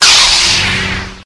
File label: saber on; lightsaber